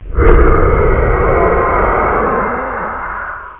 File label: BREATH